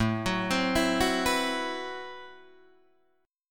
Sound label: A Minor 6th Add 9th, Guitar, Drop D